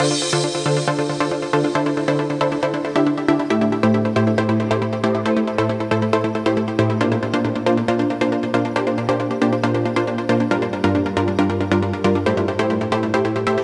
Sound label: 137BPM Complete Mix, D minor; Vengeance Samples; Universe Kit